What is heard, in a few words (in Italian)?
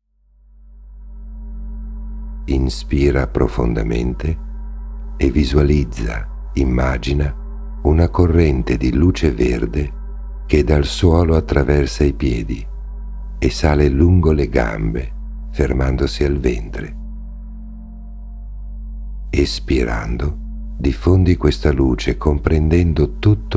Meditazione Dinamica NATURA